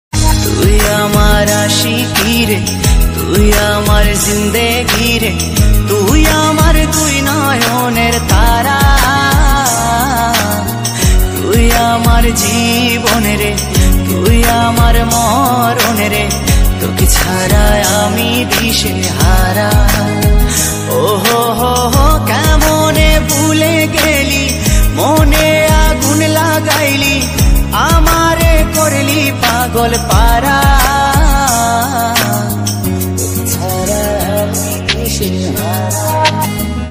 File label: BANGLA RINGTONE